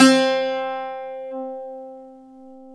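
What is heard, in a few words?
Bouzouki C 2, Mediteranean Traditions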